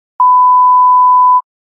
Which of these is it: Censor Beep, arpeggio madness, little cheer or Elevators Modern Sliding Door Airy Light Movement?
Censor Beep